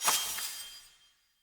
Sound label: Player Melee Perfect Strike, Swords; Weapons